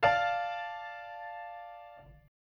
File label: Dm higher, chords